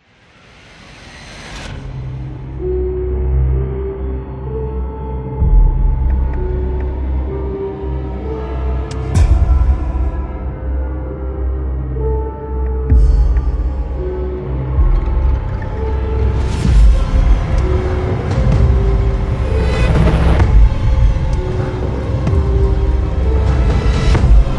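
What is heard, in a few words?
Fear, Dark horror background music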